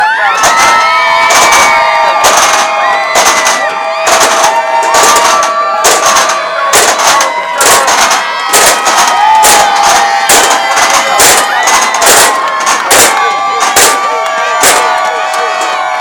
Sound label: cadence